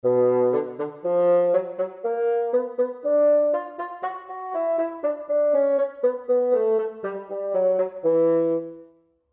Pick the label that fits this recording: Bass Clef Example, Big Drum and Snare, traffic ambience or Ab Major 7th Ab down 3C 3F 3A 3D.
Bass Clef Example